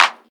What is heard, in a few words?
clap, drums